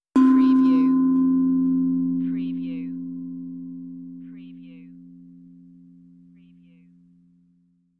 Tongue Drum Meditative Tone; beanstalk audio

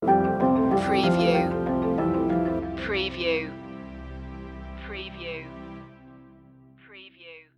sunlight stinger

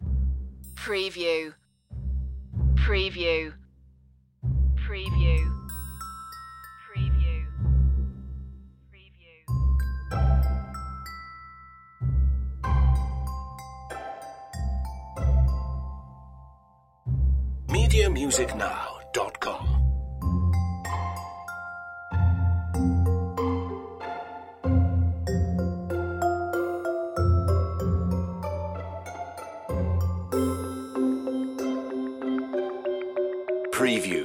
no melody

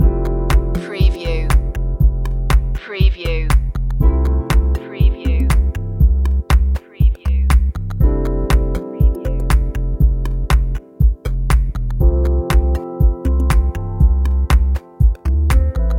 loop, chill

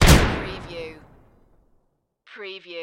Grenade Close

